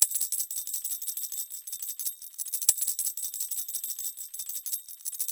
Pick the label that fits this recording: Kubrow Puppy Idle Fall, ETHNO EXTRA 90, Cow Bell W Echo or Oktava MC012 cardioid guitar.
ETHNO EXTRA 90